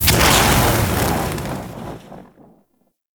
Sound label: Eruption Radial Fire; Warframe; Sargas Ruk